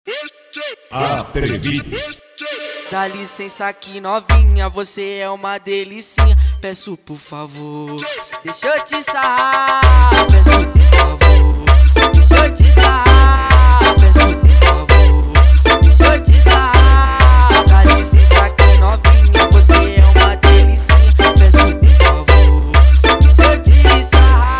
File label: funk mega bass